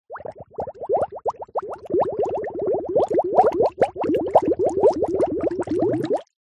foley bottle plastic small empty submerge deep water bubbles; zapsplat